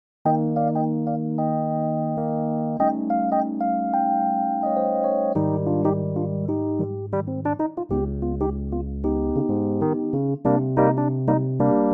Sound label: Eb electric piano; key - Eb - vocal range - Eb to C; Wonderful song with a cool groove; Fender Rhodes piano